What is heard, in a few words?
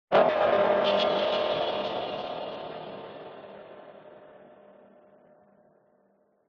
cinematic hit stab shock scare mid range; sound design